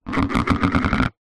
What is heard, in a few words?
household plate plastic childs down on surface